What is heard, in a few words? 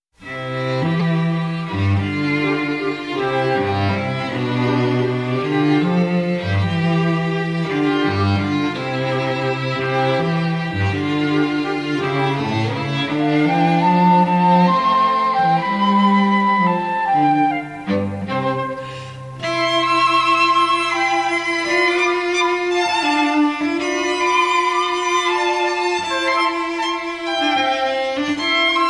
Emperor Waltz; Strauss